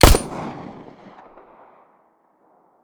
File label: pistol shot; Pain Train